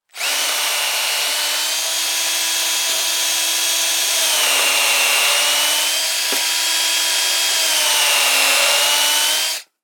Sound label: industrial drill cordless drilling screw into wooden sleeper; zapsplat